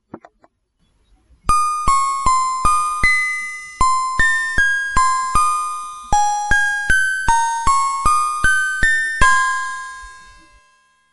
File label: chime